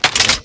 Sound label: bolt forward; undergroundproject